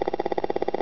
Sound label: STATIC LO